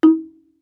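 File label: Marimba deadstroke fortissimo Eb4; MIS Pitches - 2014; Percussion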